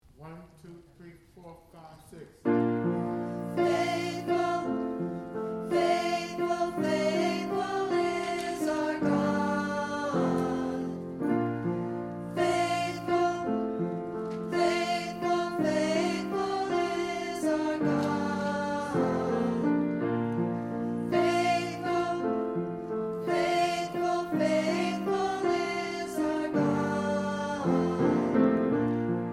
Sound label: Alto part